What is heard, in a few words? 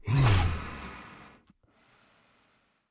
AMB China 3D Amb; gamerip